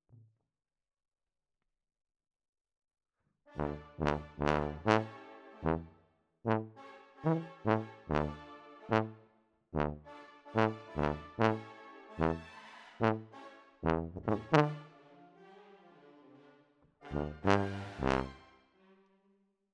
Tuba, jazz for piano

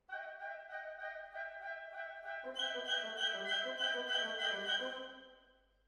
Hall

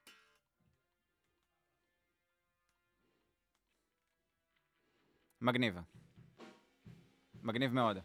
Voc

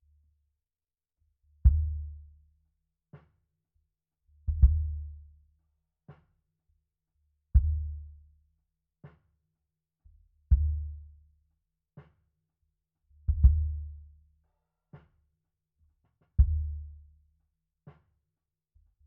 Sub

